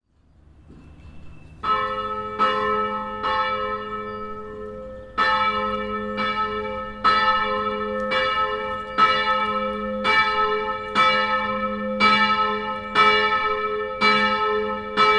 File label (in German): glocke; friedhofsverwaltung